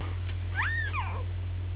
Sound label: squeal